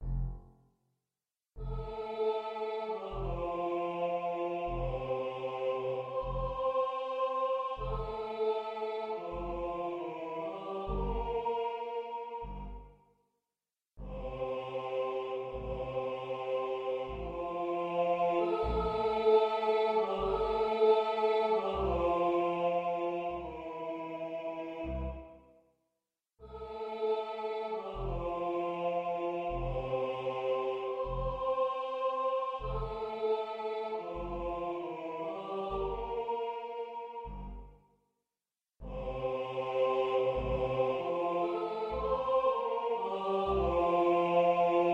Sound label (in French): choeur des esclaves